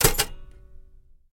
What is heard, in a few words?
Typewriter Shift Ke